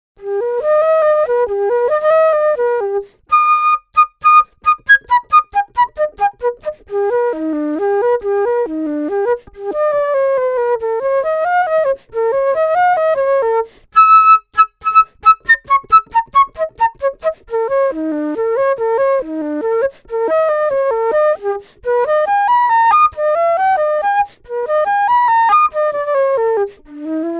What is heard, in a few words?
flute